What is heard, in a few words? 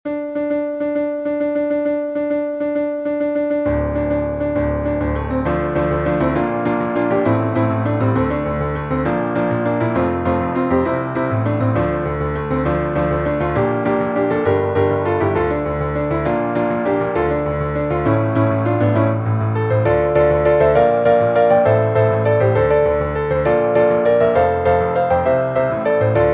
intermediate piano